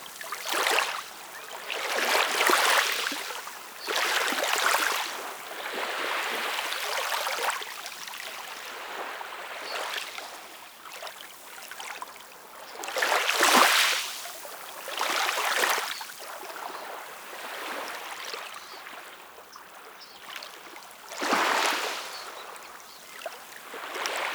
Small Waves Splashing; UltimateFishing2020

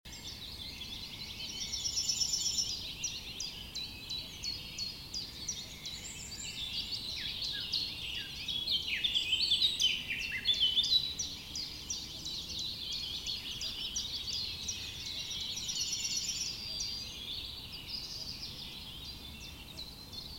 morning european forest loop; UltimateFishing2020

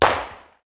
Glock; Ultimate Fishing Simulator 3 Demo

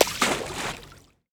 fast water splash

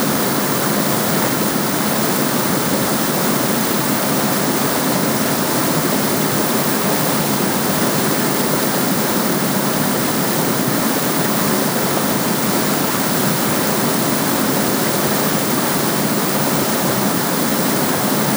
Water Fall; Fishing2

